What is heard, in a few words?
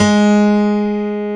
PIANO G#3; Techno Trance; Synths & Keys; E-MU Formula 4000 Series Vol. 2